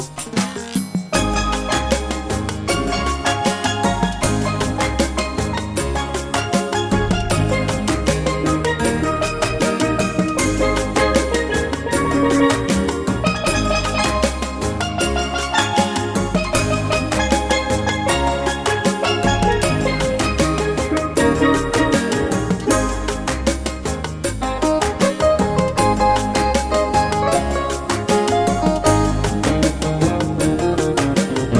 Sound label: Calypso